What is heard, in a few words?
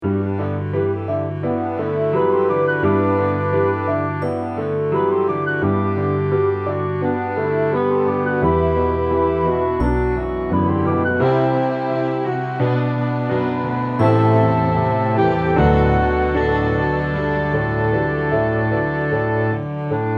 Serious Show Tune